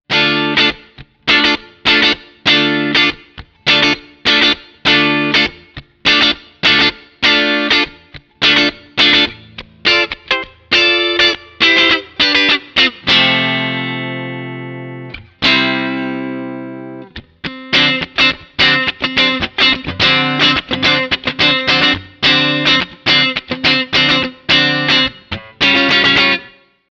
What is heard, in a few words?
Tech 21 SansAmp English Muffy clean; Char. 2h Drive 12h Muff off